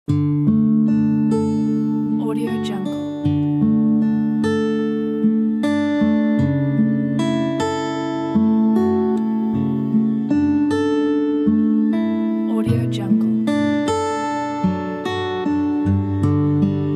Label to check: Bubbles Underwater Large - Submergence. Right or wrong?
Wrong